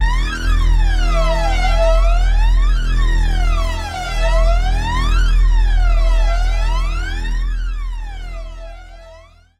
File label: Doom Siren, Sylenth1; Routed Sound; Sylenth1 Expansion - Doom Carpenter Vol.1